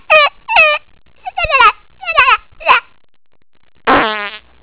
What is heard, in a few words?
baby fart; funny; fart sounds